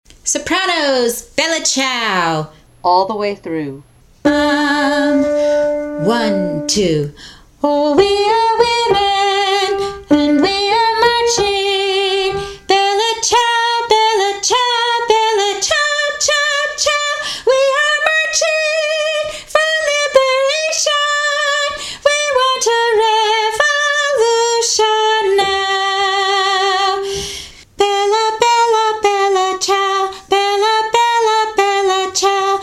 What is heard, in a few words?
Soprano II; all the way through; Bella Ciao